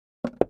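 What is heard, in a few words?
Mech Wood Object Move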